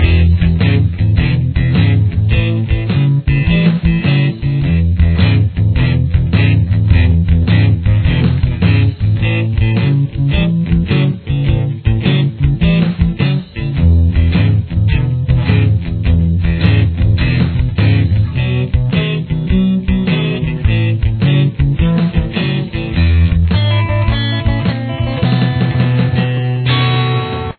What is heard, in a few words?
shuffle in e, guitar